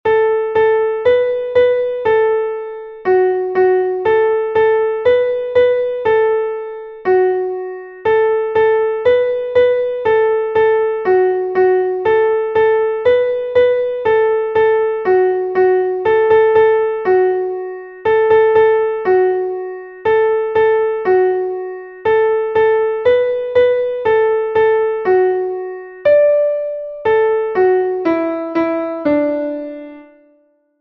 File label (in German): klavier, Kinderlied; Backe, backe Kuchen; MelodieMeister